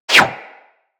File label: Bullet Parry Metal; Impacts; Bullet Parries